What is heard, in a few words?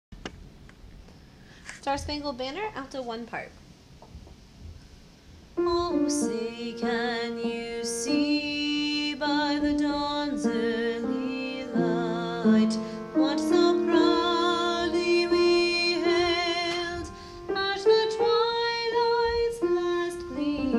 Star Spangled Banner Alto